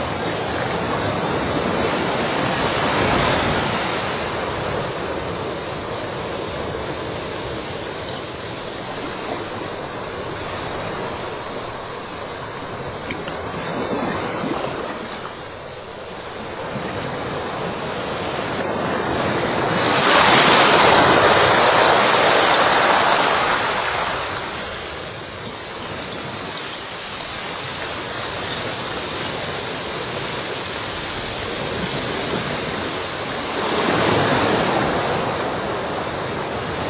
Waves Cave

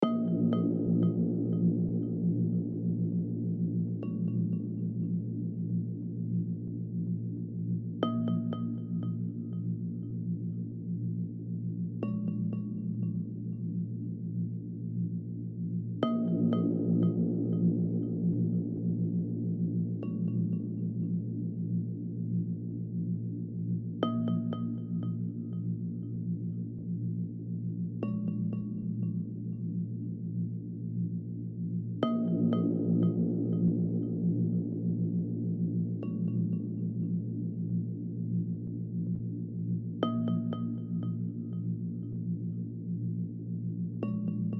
marimba loop

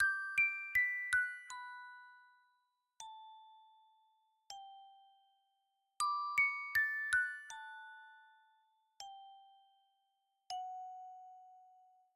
Sea

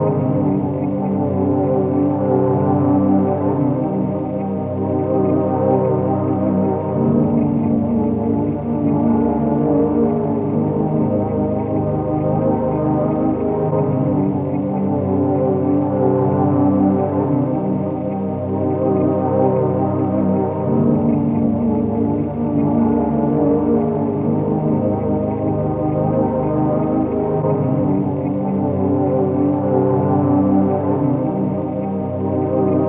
chords

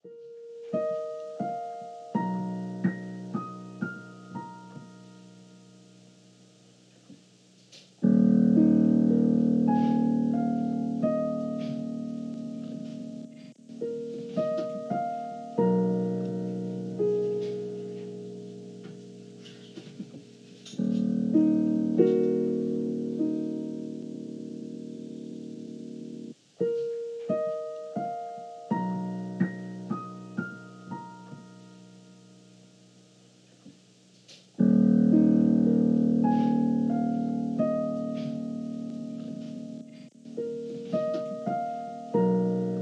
Rio Piano

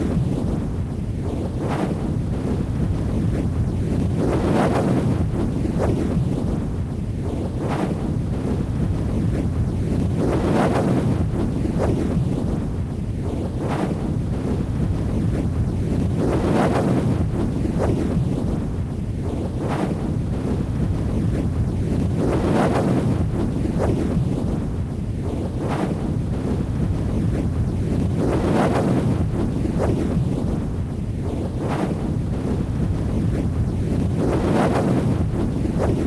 Wind

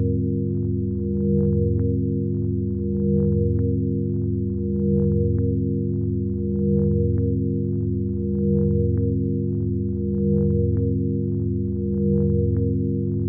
bgm fast

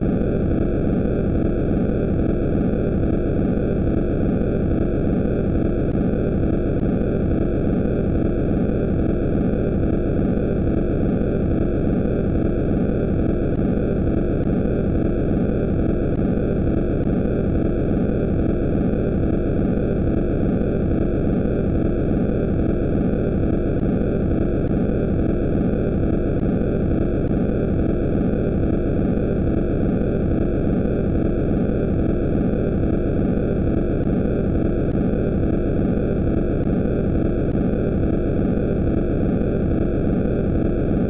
noise track

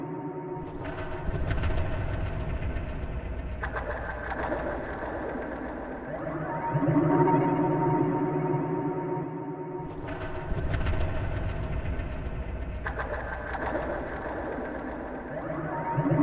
strange ambience

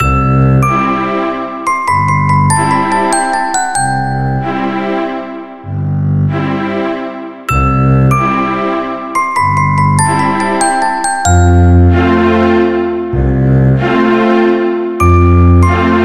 Quick Waltz

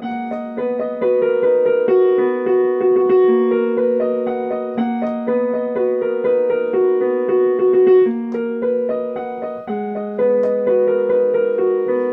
Piano; Shadow Lady Estate

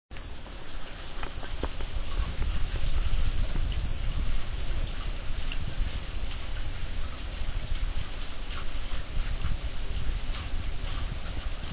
Rain noise slow

